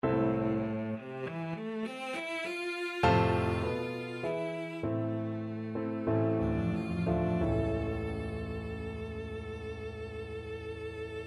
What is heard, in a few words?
cadences